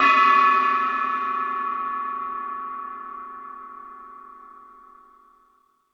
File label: Lazer Drums, E-MU Pro-Cussion; drum machine